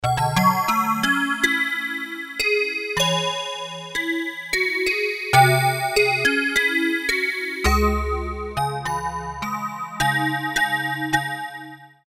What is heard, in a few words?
Tibetan Bells, Roland MKS-70